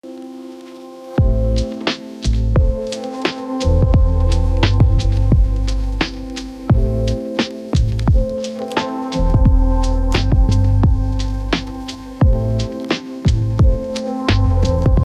lo-fi hip hop beat

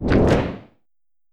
fast impact blow

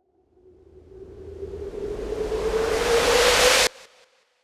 whoosh build up

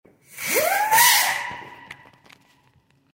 funny firework

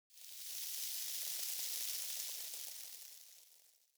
rattlesnake in desert

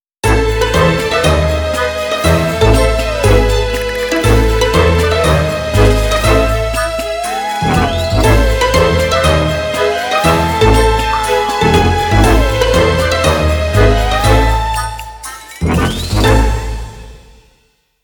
funny halloween cartoons

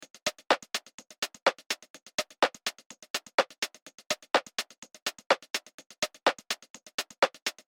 rhythmic percussion loop

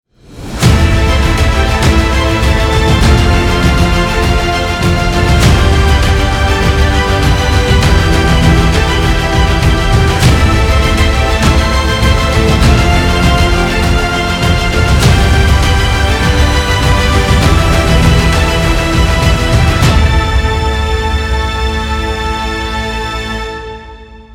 epical hero

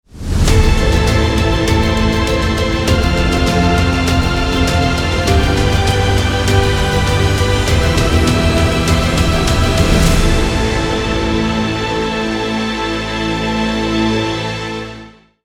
cinematic epic opening

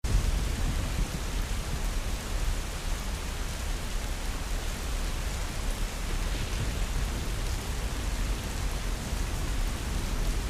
sonido de lluvia rain sound

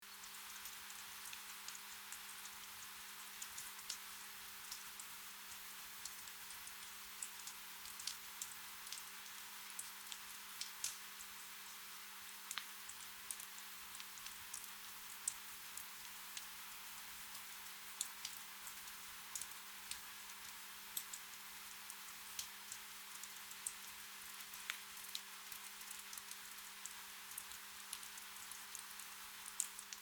cozy midnight rain

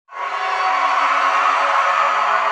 scream; hollow knight

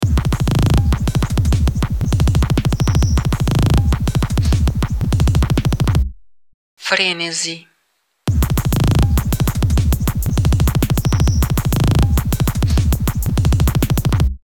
frenesicore drum

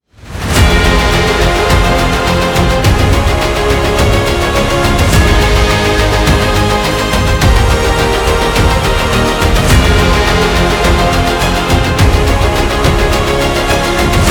cinematic inspiring opening

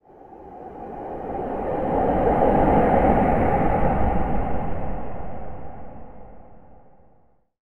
ambient sound in the desert

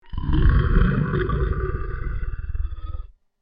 guttural snarl

